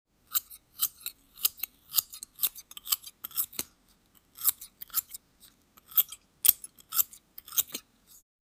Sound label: a realistic sound of slic, fruit